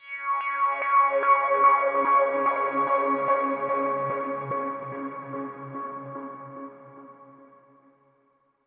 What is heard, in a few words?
Overlay Dark